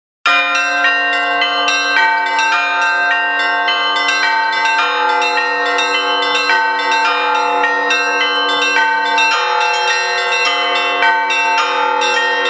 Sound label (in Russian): zvon